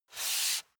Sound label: step barefoot wood parquet slide medium; Footsteps; schritte